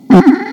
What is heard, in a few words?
farts